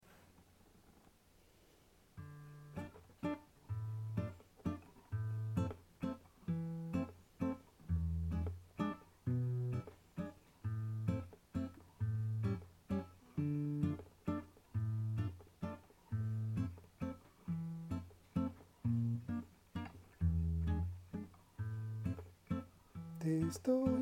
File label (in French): Voix Guitarre